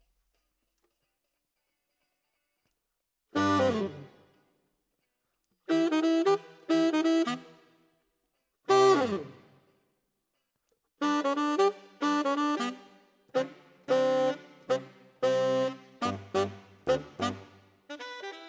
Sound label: Tenor, RNR for piano